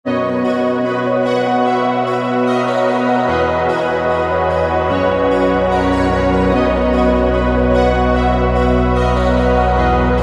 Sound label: Fantasy World; Vengeance Sound Avenger 2; Factory 2